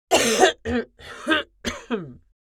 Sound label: Female old woman clearing throat; cough